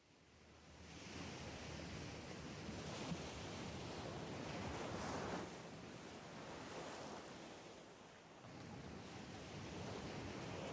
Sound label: wind and waves sound